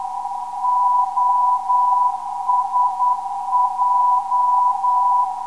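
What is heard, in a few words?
non-directional beacon; OSJ; 420kHz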